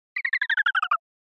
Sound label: Motion Pop